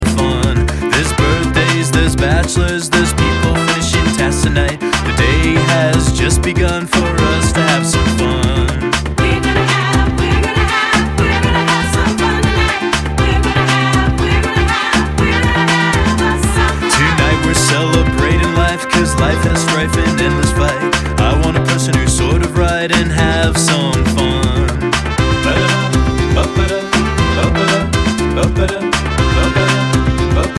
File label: Fun Drum